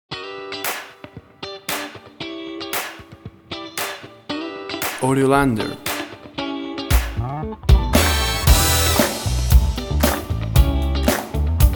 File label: UBER FUNK